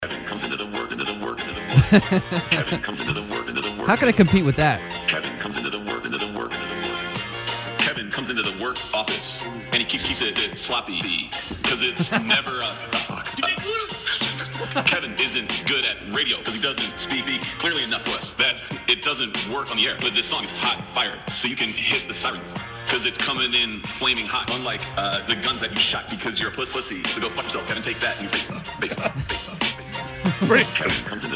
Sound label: rap